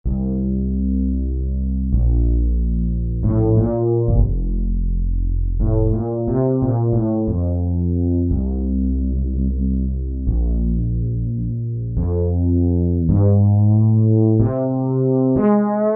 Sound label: Fretless; Factory Patches